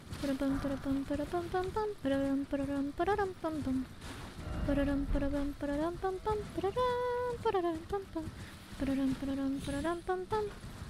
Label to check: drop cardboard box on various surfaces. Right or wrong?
Wrong